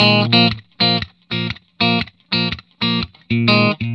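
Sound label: 120, Vintage Blues Guitar rhythm; USB Soundscan vol.22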